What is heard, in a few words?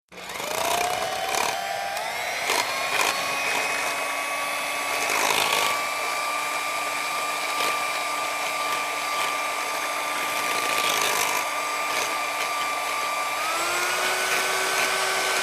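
Electric Mixer Whipping; appliances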